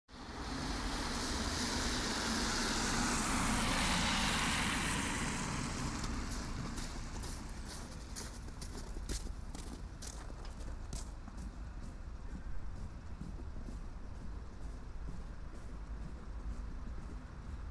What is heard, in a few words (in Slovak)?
centrum velka okruzna; zilina